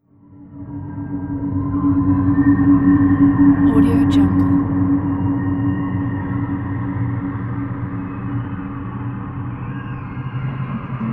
deep catacombs soundscape; blackmidstudio

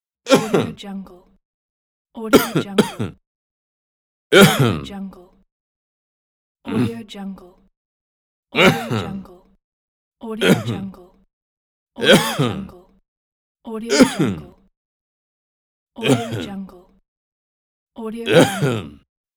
cough male pack

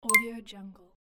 twinkle reveal

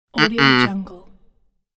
cartoon peasant refuse shout

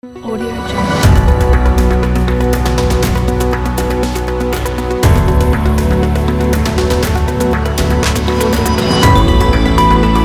news opener logo

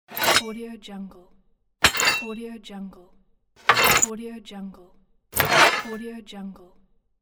axe unsheath

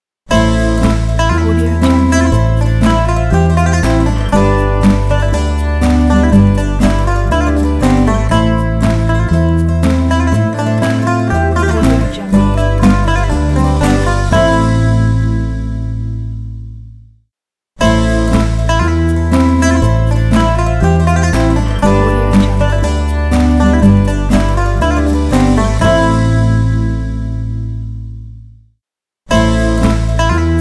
greek logo

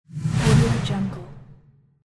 whoosh transition; archisound

